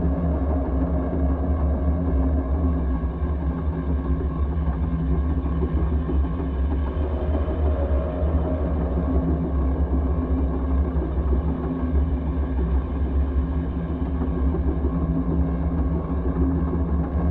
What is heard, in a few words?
ship slaver idle; pre-FTL; Stellaris; first contact